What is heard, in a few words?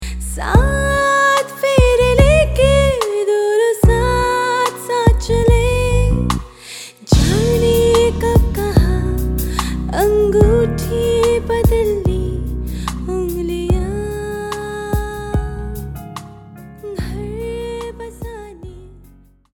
WEDDING WISHING SONG HINDI FEMALE